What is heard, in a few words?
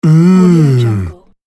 mmm man